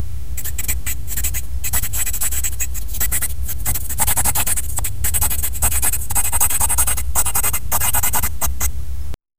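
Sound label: realistic sound of a marker, stationary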